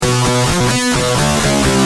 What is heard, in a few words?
G Pump, Guitar; Industrial